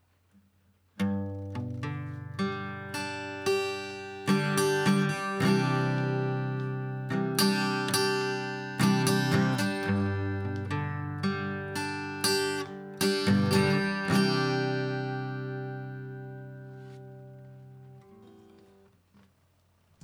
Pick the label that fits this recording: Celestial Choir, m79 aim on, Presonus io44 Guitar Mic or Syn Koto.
Presonus io44 Guitar Mic